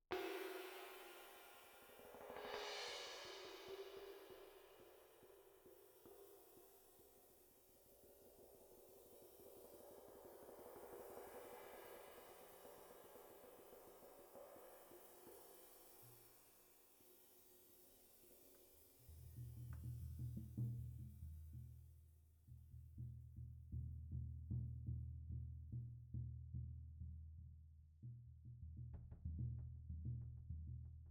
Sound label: OH